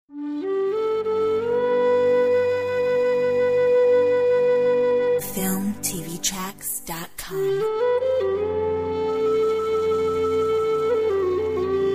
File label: Irish soul